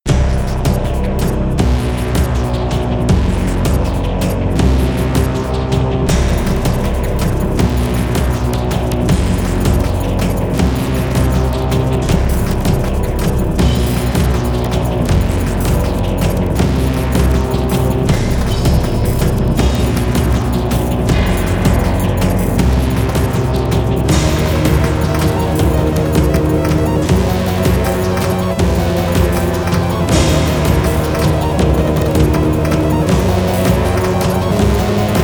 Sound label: Scary Music